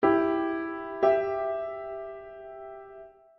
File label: music